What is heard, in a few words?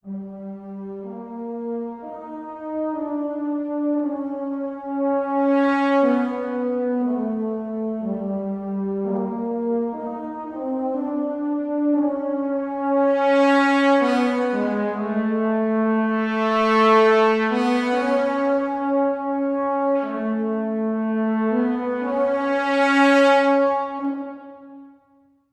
Dramatic Horn, 120bpm